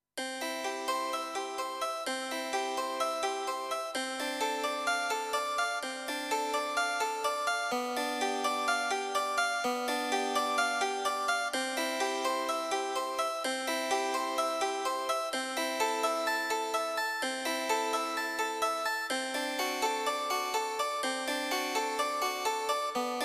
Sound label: Harpsichord, Yamaha Clavinova CLP-270; I SUONI - GLI STRUMENTI XG